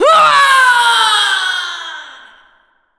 falling

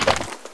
rpg reload

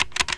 keyboard clicks; ambient

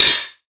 grind, manhack; npc

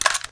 mp5 mag out

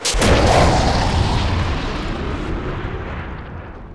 teleport, ambient machines

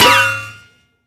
metal solid impact bullet; physics

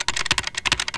keyboard fast, machines; ambient

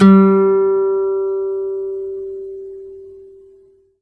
string

